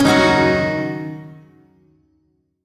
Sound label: Shawzin Yo Chord D; Warframe; Duviri